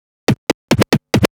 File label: Scratch Fills 140BPM